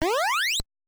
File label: jump; Back to the Future; nes